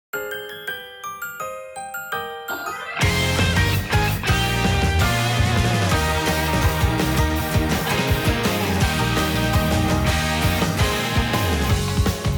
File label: inst